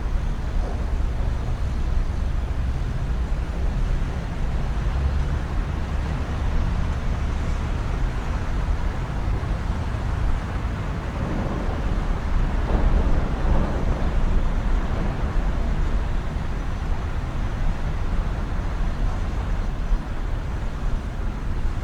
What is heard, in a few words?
amb; cstrike; zm japan